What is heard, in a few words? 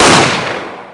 gunshot